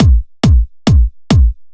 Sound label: Freefall Kit 138BPM Kick 4_4, Drums & Perc; Vengeance Samples; E minor